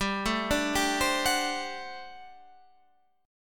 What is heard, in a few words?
G Major 7th Suspended 2nd Suspended 4th G